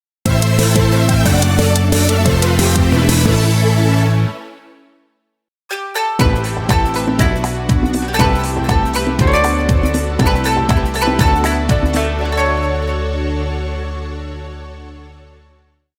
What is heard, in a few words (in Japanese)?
ニュース